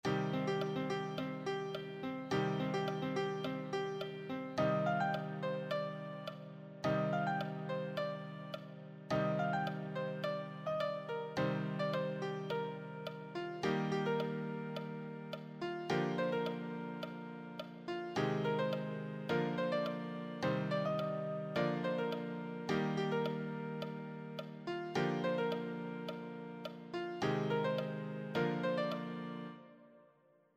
easy solo, piano

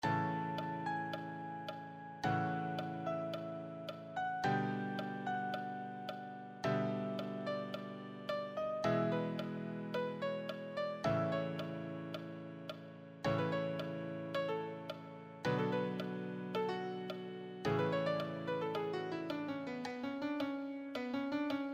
easy solo, piano

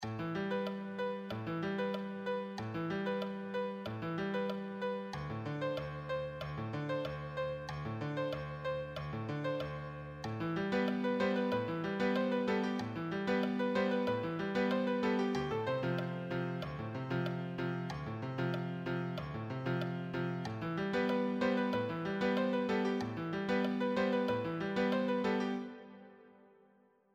solo, piano solo

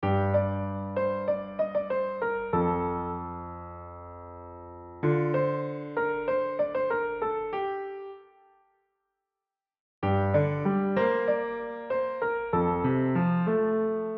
solo, piano; Shershaah

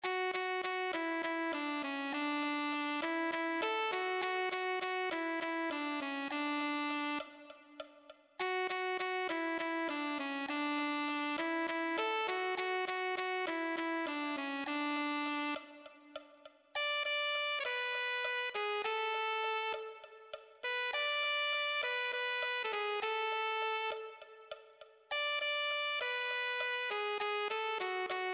alto saxophone

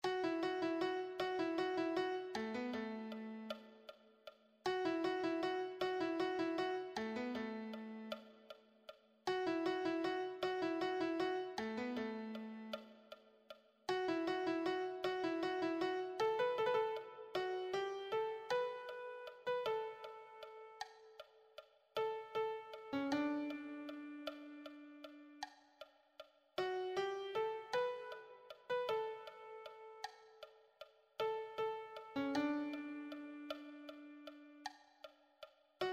melody

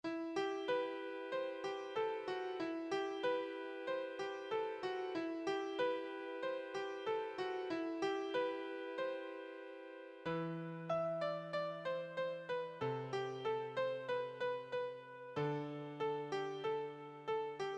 beginner solo, piano

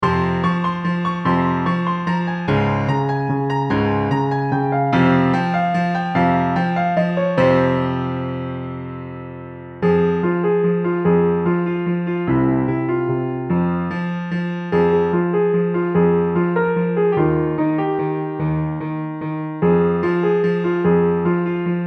solo, piano solo

